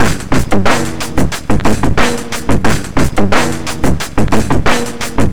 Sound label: squeezebox, 90bpm